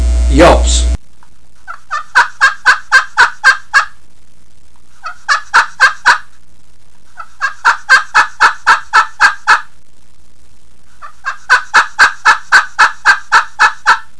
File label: glass yelps, turkey; dixie